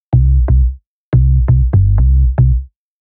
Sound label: Picked Bass